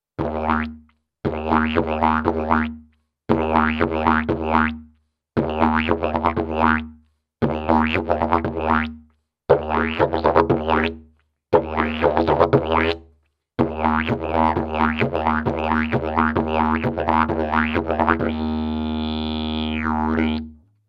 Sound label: didgeridoo; olse strom